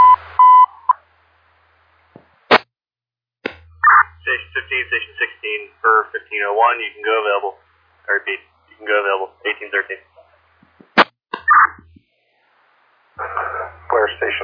alert tones page received

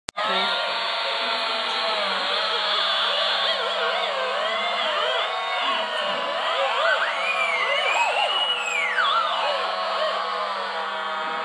synthmaking

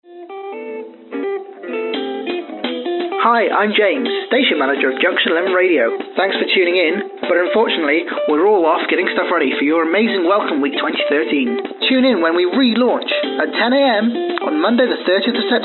off air retro telephone answermachine jingle

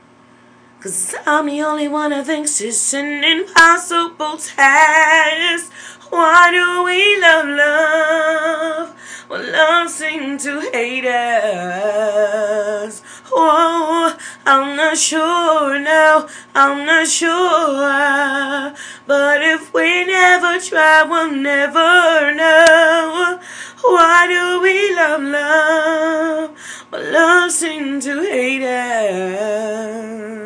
me singing

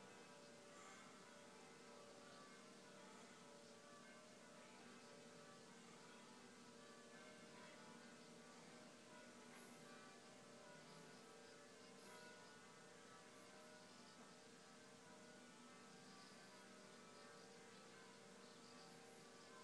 peals of church bells at 9pm kings bromley staffs

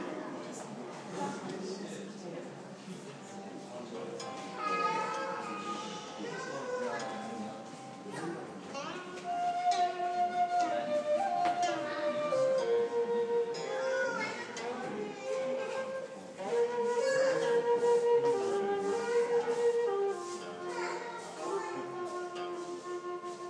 chinese guqin music; National Gallery of Victoria

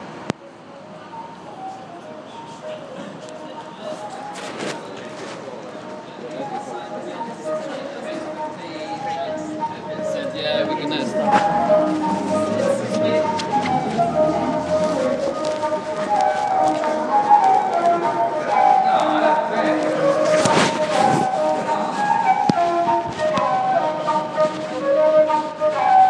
blackfriars bridge busker

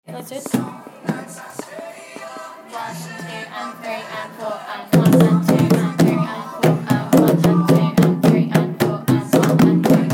rhythm

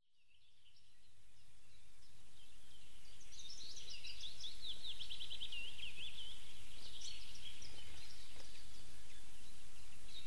dawn chorus with birds

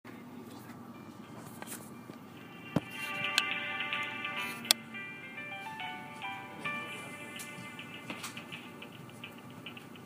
hold music

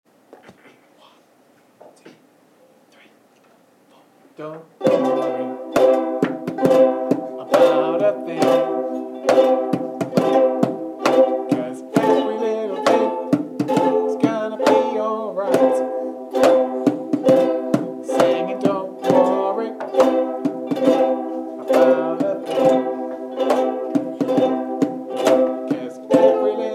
ukulele group

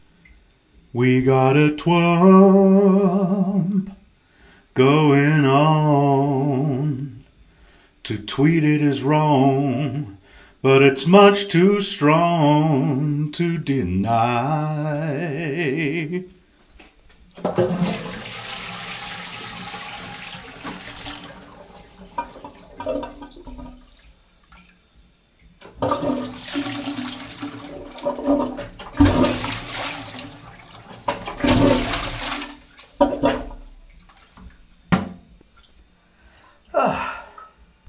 singing

